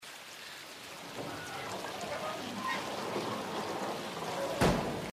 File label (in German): feedback auto verschieben; inside